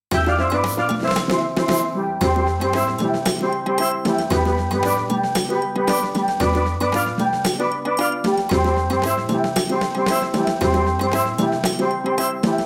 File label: SC880